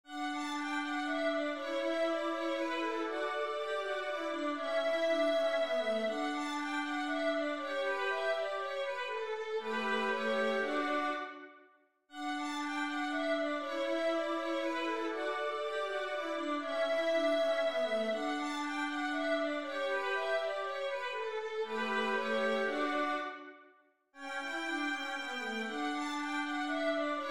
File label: etude, violin